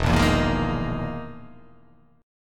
E Major 7th Flat 5th down

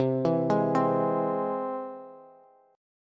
C Augmented, Bass, chord; arpeggiated; JGuitar

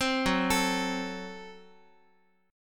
Gb Diminished Guitar; arpeggiated; JGuitar

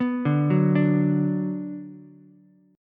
D 6th D down Ukulele, chord; D6 arpeggiated; JGuitar

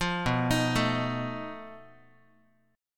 B 7th Flat 5th B down Guitar; B7b5; arpeggiated; 8,7,6,7,x,x; 4A,4E,5A,5D,5G#,6C#

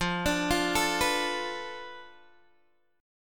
F 6th Flat 5th F down Guitar DADGAD, chord

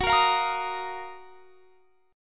Gb Major 13th; GbM13 strummed

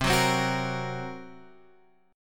Gb Major Flat 5th, C

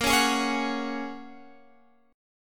Bb Minor 7th Sharp 5th, Guitar, Half Step Down

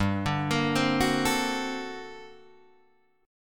G Minor Major 11th, G, Guitar, Drop D

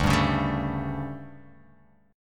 D-Major 7th Suspended 4th, D, Guitar, Open F; DM7sus4; strummed; JGuitar

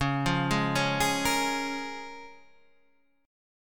C sharp 6th

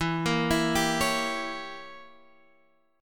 A sharp Diminished 7th E

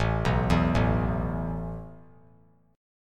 A Suspended 2nd A; Asus2 arpeggiated

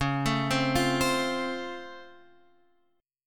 Db Augmented Major 7th, down, Guitar, Open F; arpeggiated; JGuitar

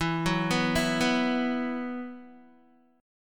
E Suspended 2nd E, down, Guitar; Esus2 arpeggiated

